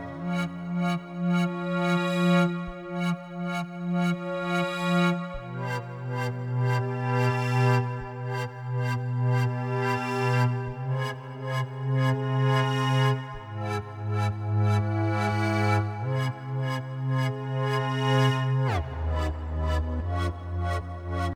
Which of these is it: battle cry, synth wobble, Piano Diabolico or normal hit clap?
synth wobble